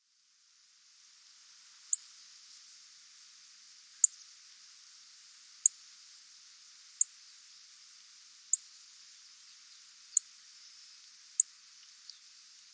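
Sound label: Japanese Reed Bunting; koe; utsunomiya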